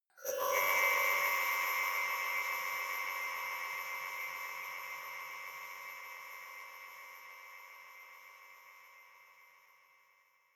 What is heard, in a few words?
notification enchantment; signale